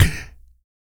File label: VOC PERC, Vocal Planet Jazz FX BASS PERC